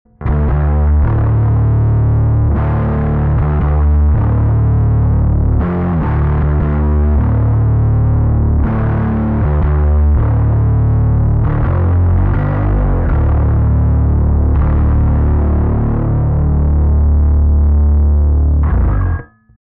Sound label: overdrive all bassboost; Omega